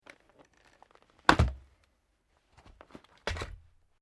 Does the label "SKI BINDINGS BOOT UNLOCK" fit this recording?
Yes